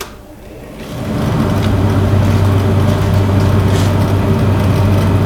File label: Furnace Switched On